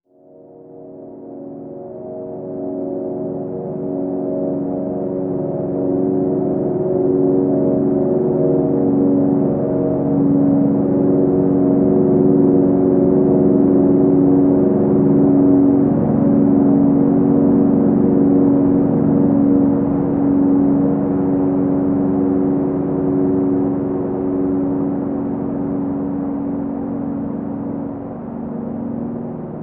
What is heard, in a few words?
Piano In Slow Motion